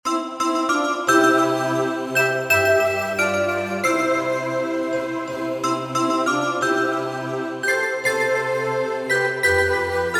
mel pad

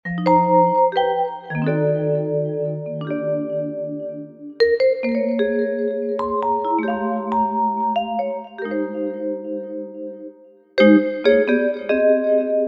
j-vibes; CMS Weihnachtskalender 2023

